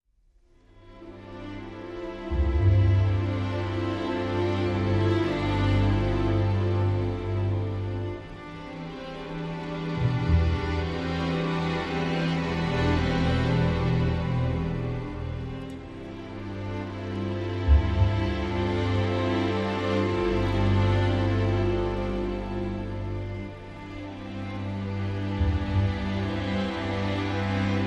Intro No Guitar

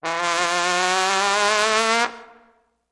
Trombone Gliss Up With Fast Vibrato

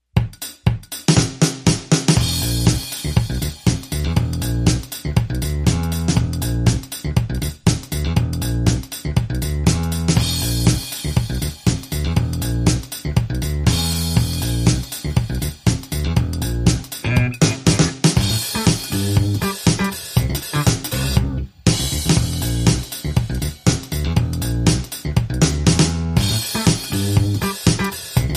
Drums And Bass Mix

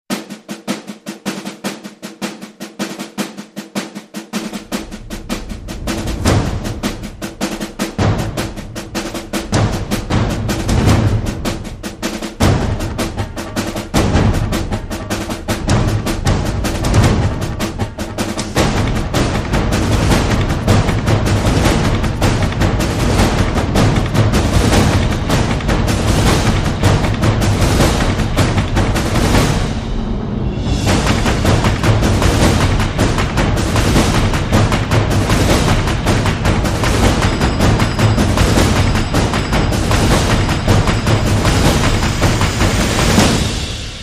Drums Militia

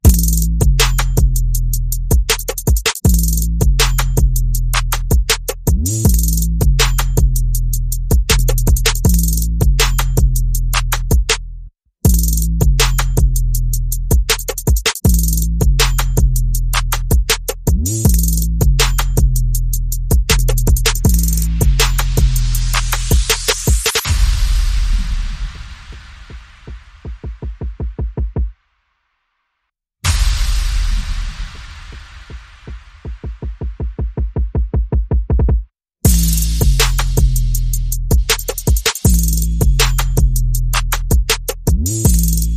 Bass and Perc Only

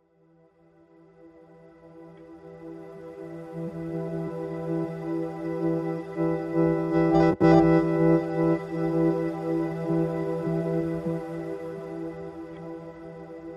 No Bass Synths No Ambient FX

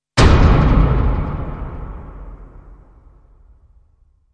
Main Hit

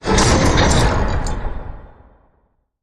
Crashy Rattle Impact

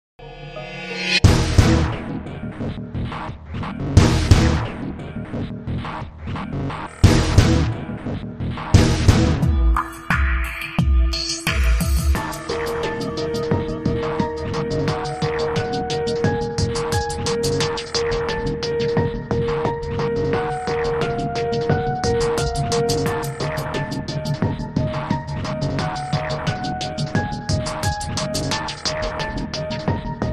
Attack

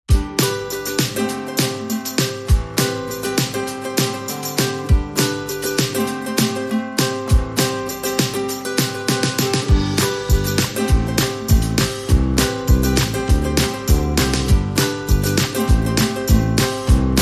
Festive Shuffle, No Voices No Bells

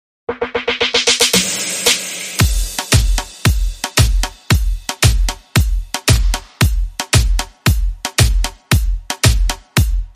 Drum

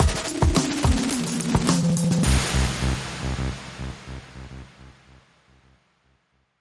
Pastoral, Sting

